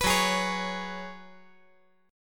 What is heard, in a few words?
Gb Suspended 2nd Suspended 4th